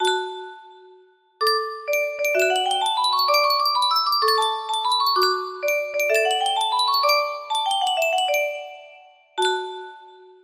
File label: Yunsheng Music Box, music box melody